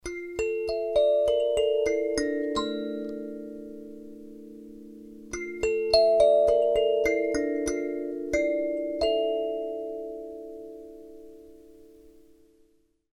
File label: HOKEMA SANSULA KALIMB AKEBONO